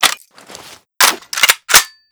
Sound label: fnmag reload end; emplacement